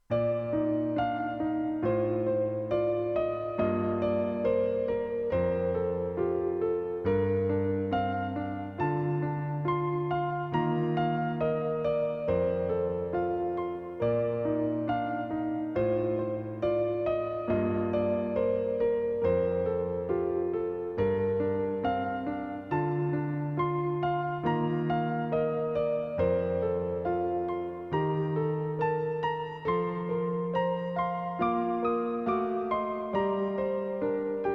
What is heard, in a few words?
Piano